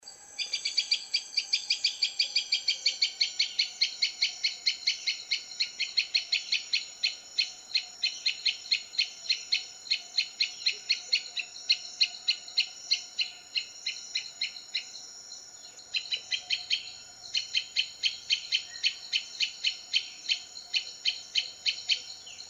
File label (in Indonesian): prenjak betina common tailorbird